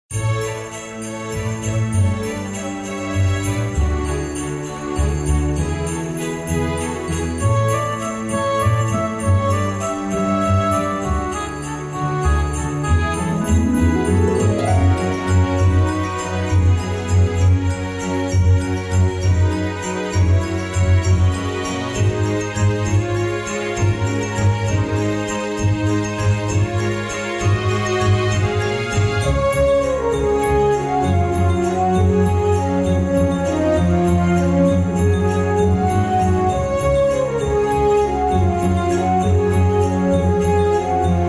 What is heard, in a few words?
holiday trad